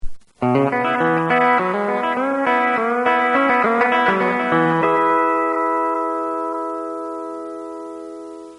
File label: Banjo Chops